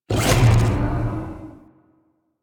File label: Monster Spit; Warframe; Infested